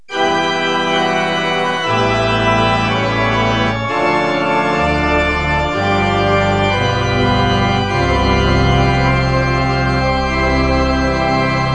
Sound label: Organ with Choir; Pianos & Organs; KABOOM! - Wild and Wacky Sound Software; Music